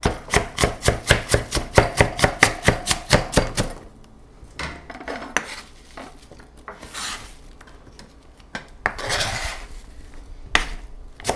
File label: Chopping Mushrooms Vigorously